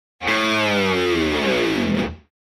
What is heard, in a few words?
Heavy rock guitar slide down